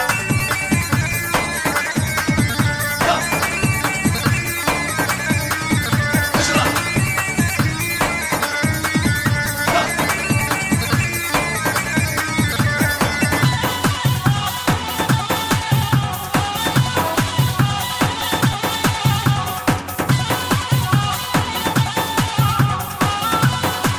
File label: Syrian Dabkeh